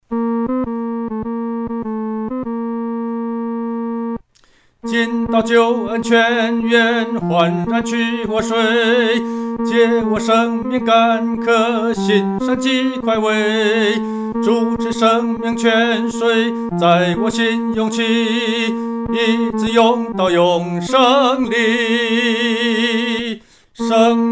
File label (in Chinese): 独唱 第三声